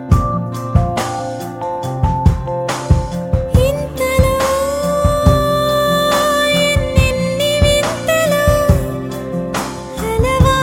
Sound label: Female Version